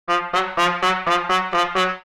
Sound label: Old vintage cartoon walking